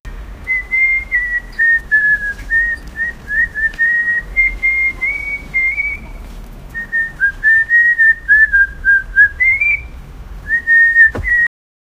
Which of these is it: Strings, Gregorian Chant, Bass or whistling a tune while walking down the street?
whistling a tune while walking down the street